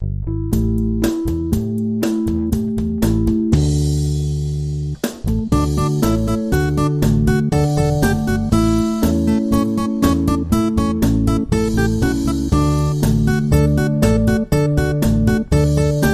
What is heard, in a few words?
Dreidel Dreidel; Trad (PD)